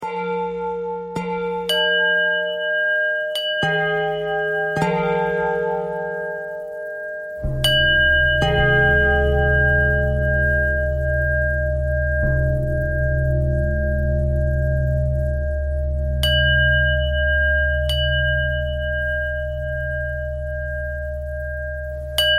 bell thread pentatonic

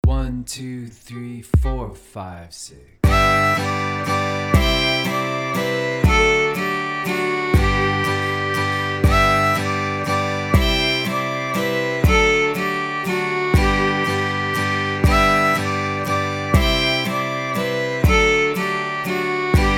Jig Variation, fiddle; kesh

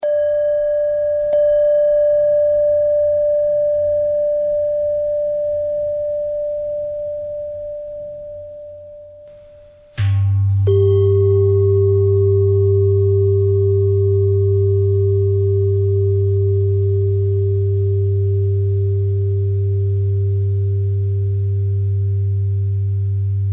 bell threads

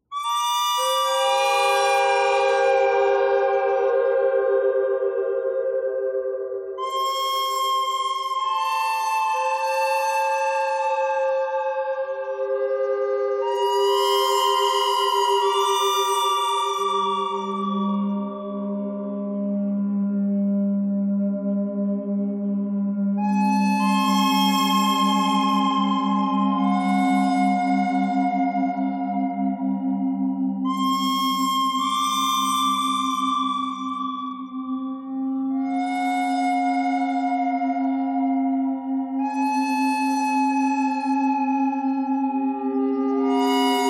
bird thread buzz; noreverb

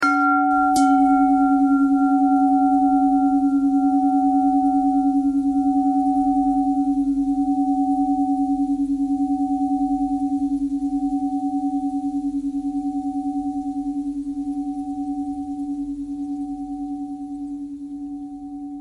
bell bell thread noise

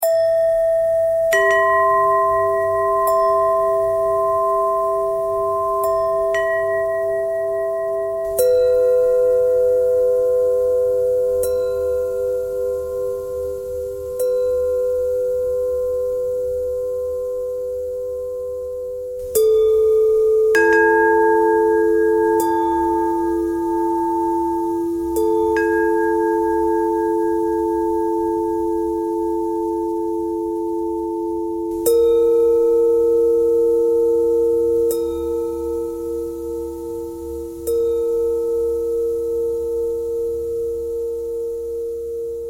bell bend threads

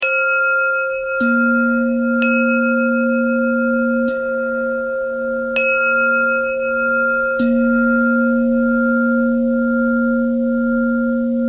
bell bell thread noise

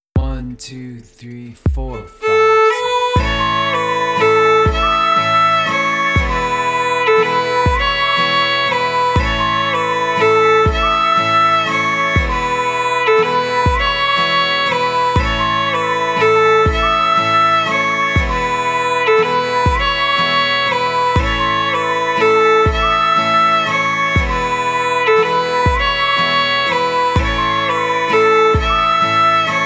Ida Red Jig, fiddle

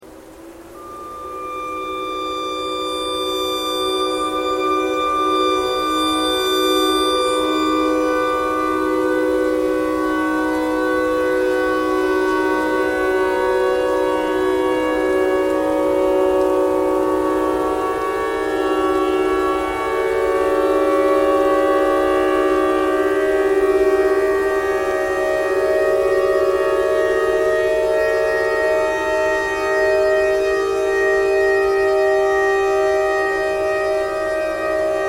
clarinet notes e tornado siren noise all

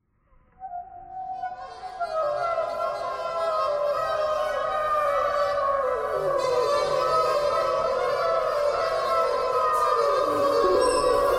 clarinet jazz clarinet thread noise; clarinet geese

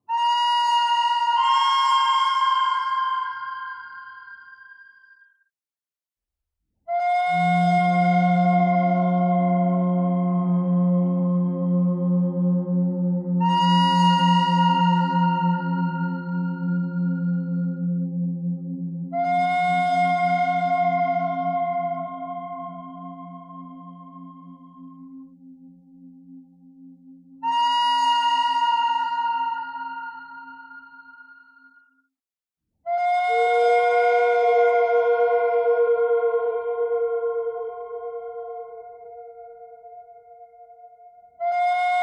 bird thread simple; noreverb

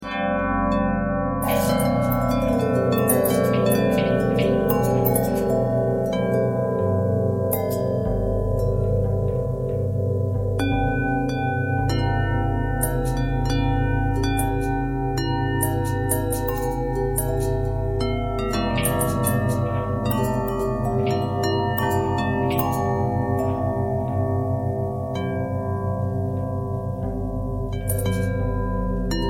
longbell bell bendtwist

